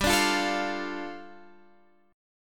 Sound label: G 7th Suspended 2nd G Guitar Half Step Down